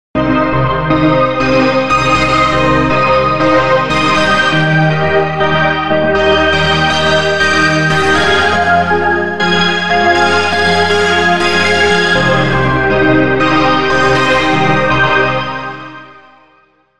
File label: Ensemble spreader